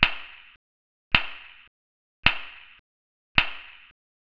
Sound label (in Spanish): cuatro palmadas; negras; primartis